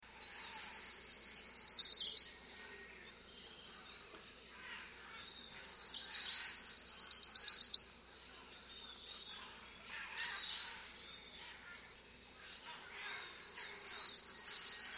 Tropical Gnatcatcher; S. America

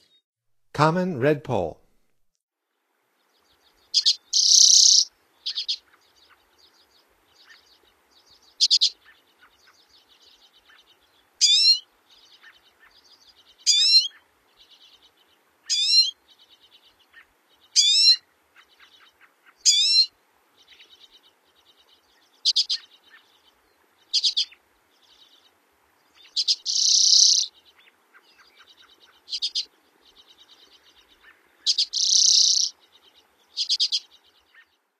Common Redpoll, Songs of Birds of North America; Stokes

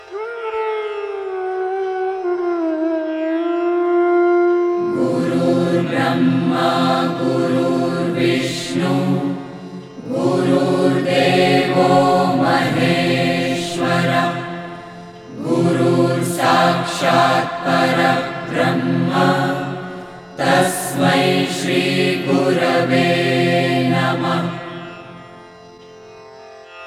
Shri Shanakr stuti, himalayan chants

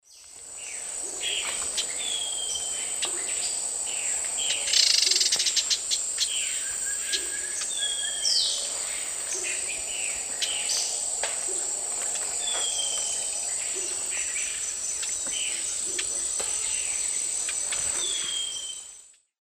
Microbates collaris, call and alarm call; S. America; Eastern Lowlands

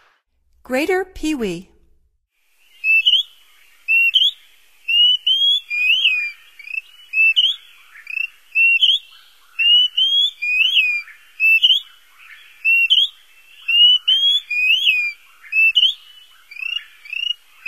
Greater Pewee; North America; Stokes

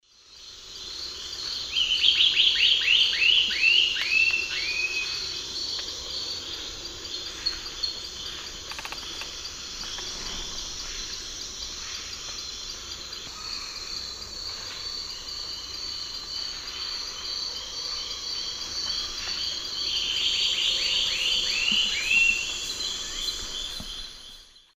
Gymnopithys lunulatus, song; S. America; Eastern Lowlands

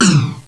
BLASTER, SPACE

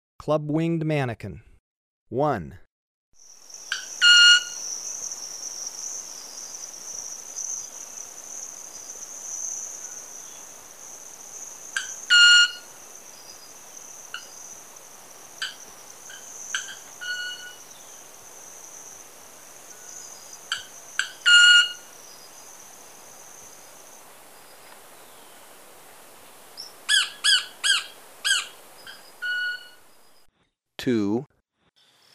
Club-winged Manakin, Birds of Ecuador; S. America

